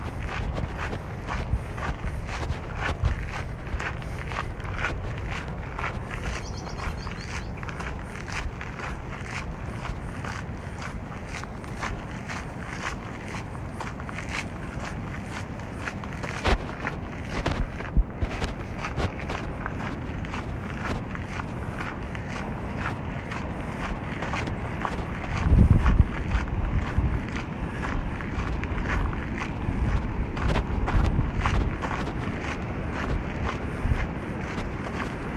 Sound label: Walking a clifftop trail